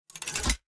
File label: gate down